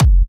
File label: Kick